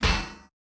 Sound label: Shield